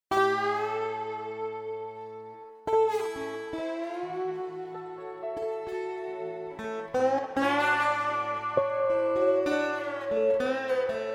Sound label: solo